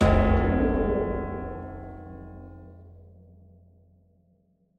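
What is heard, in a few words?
Choir Boy damage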